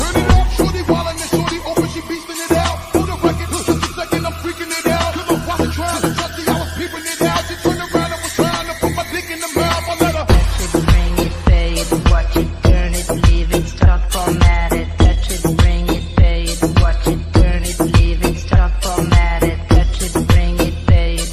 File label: Trending English Remix Ringtone; ringtones